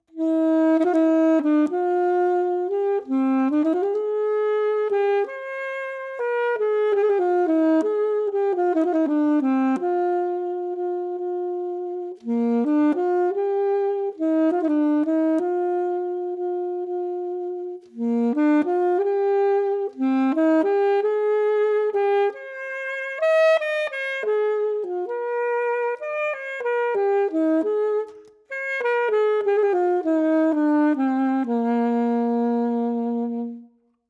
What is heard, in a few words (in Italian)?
ALTO; timbri